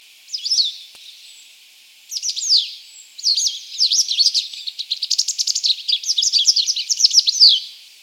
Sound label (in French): bergeronnette grise